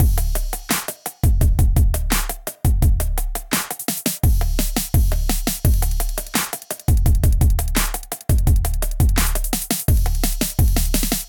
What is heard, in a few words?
Electrified Kit; Ableton; Bomblastic